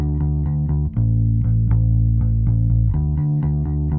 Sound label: bass; musdb18